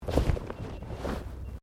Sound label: Body movement Clothing Foley